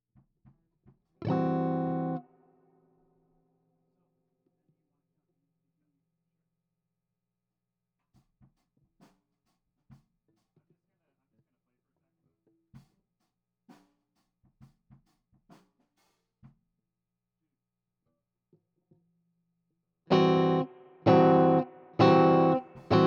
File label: GTR